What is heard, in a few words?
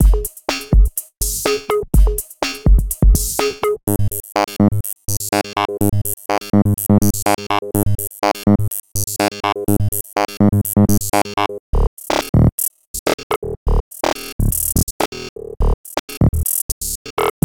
Electro Break; Robotizer